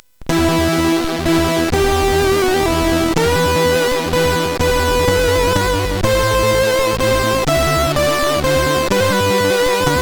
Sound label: Swedish folksong, sid CSG8580R5